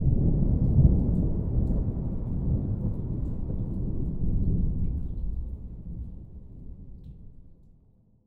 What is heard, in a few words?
thunder distant; dracula